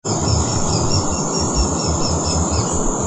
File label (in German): kleinspecht